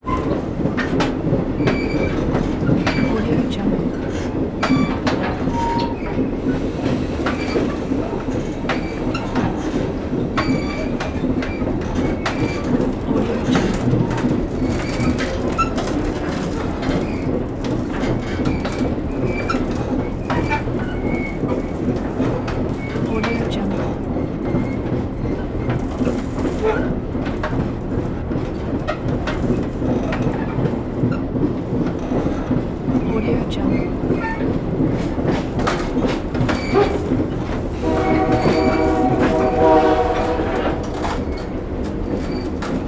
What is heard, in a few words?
passenger car 1950s streamliner train constant